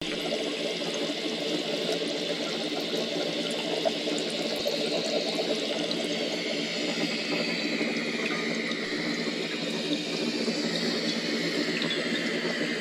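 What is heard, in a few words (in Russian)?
научно-химическая лаборатория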